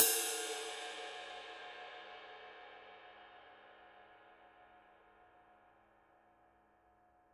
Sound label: PAISTE TWENTY MASTER MEDIUM RIDE